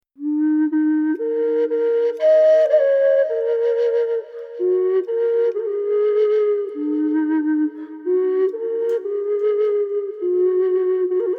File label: ocarina